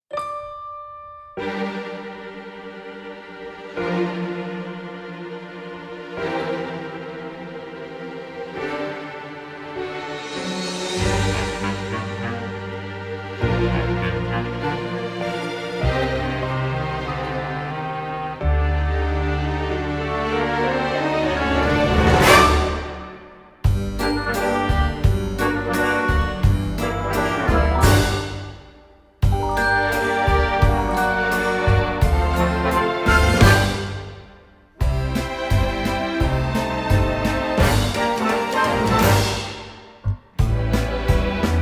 Audition Cut Instrumental; descendants

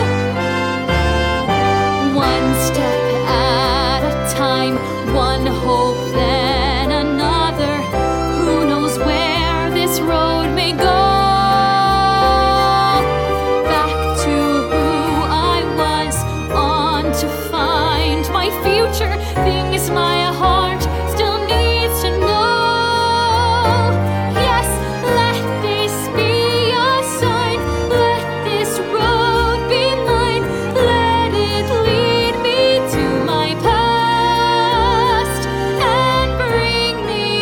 Vocals

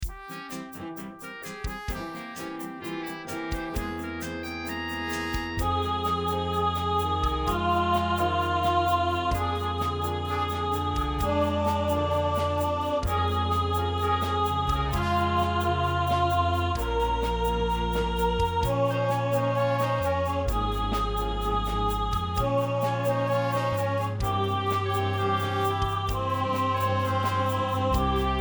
Alto 1st Part

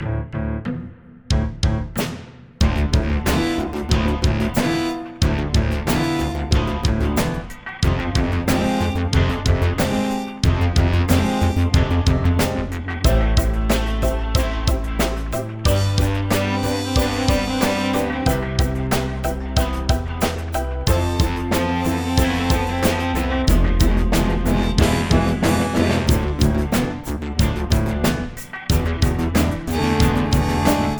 Instrumental

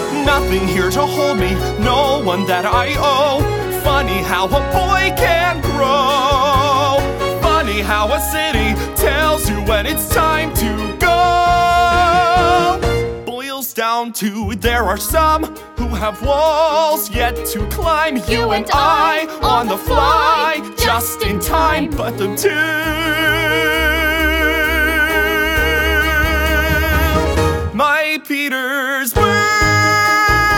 Vocals

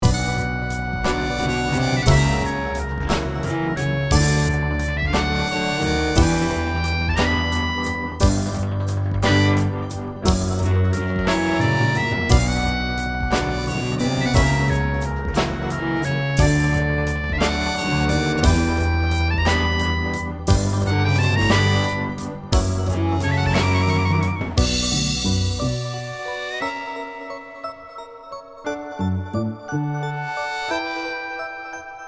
Orchestra

